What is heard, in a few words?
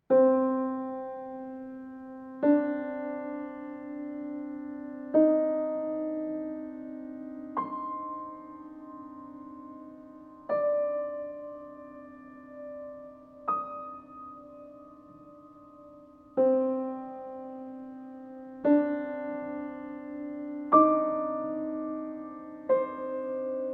For Solo Piano